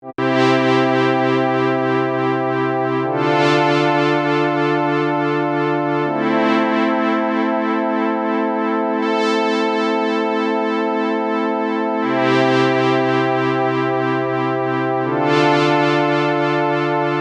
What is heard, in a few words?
Chorus